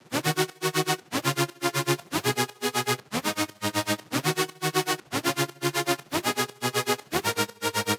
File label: Chords, Dancefloor Pop; Remixlive